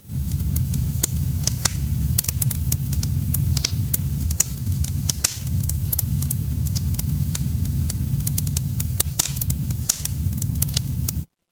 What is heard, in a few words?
ambience fireplace